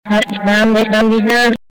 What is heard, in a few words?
fa scale